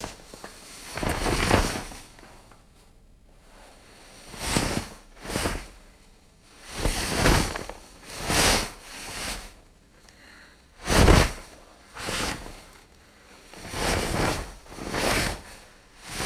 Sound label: heavy blanket and cloth rustling